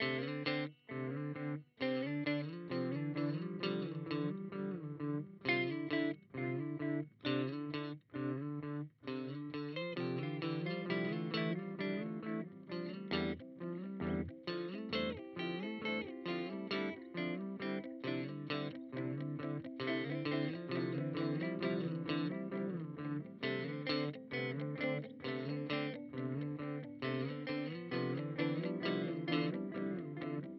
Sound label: Way Huge Supa Puss Modulation